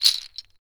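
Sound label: HUSK SHAKER, CAMEROON; AKAI S6000 CD-ROM - WorldSounds; CAMEROON PRC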